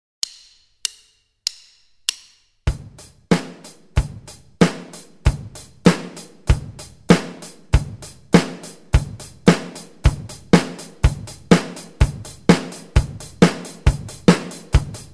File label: playback, Rhythm Guitar; Basic Rhythm Guitar II - "rock it!"